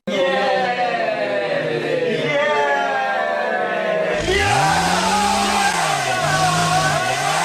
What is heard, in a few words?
yaaayyyyyy yaayyyyyy chainsaw yeeaaaaaahhh